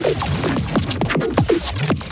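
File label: bullet reflection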